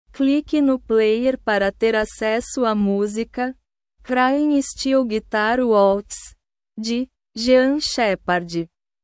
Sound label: crying steel guitar waltz